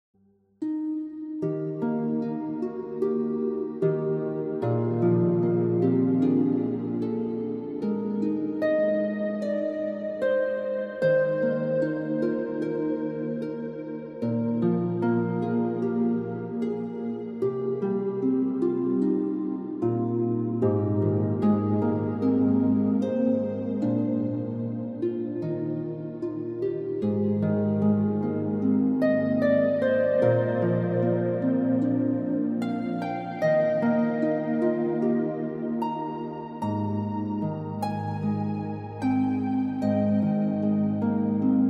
christmas music heavenly harp christmas instrumentals relaxing fireplace; CANTARI